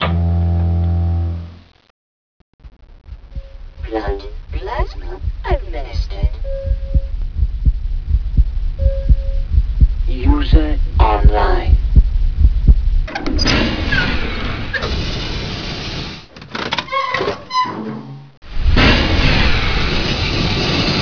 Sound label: deep sleep wake up